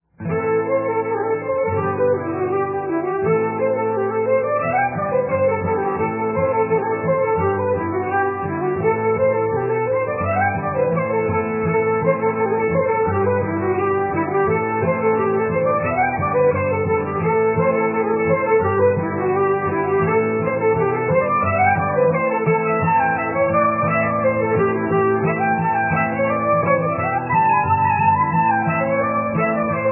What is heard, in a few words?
lofi; Castle Kelly / Salamanca / Cup of Tea / (Reels)